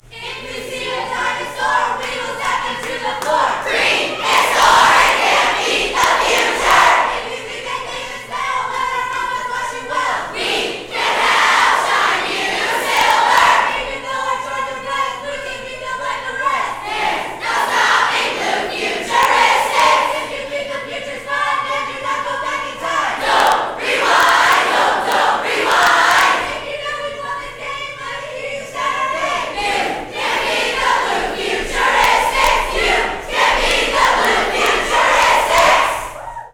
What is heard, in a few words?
Cheer